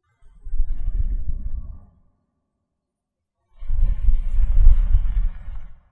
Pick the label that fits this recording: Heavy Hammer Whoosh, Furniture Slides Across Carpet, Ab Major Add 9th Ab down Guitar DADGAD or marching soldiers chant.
Furniture Slides Across Carpet